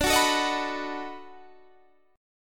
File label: B 7th D; B7/D; strummed